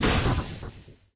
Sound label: puppuzzle; john carter; lost symbol